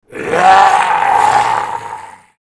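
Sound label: skeleton sword idle; FlyForInsanity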